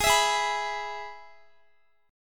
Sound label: Ab Minor Sharp 5th chord, Guitar; strummed; x,x,20,21,20,19; down; 4F,4A,5C,5G,5C,6E